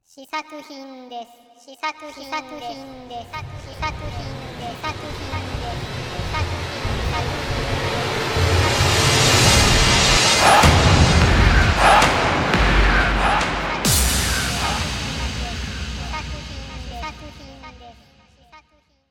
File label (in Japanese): 効果音